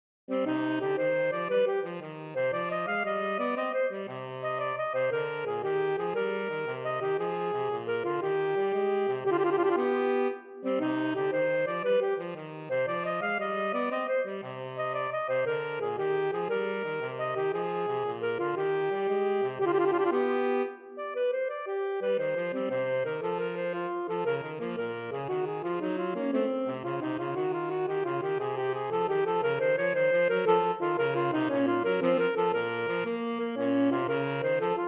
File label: Giga Allegro Duo Sonata in C minor; LOILLET de GANT; Saxophone Duet